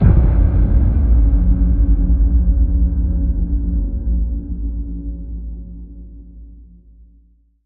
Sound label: Hit Tonal Netherworld